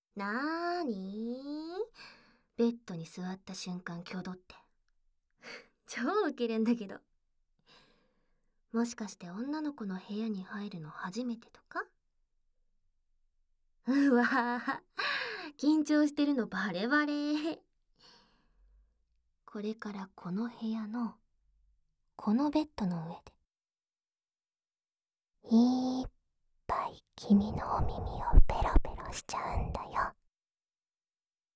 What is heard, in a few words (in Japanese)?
お部屋で耳舐め♪シコシコ音無し; リアルASMR; 咥えたら離さない 耳舐め大好きゆいちゃん!; 息遣いを感じる距離; 効果音無し